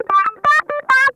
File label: STRANGLED, Funk Guitar; Zero G - Funk Guitar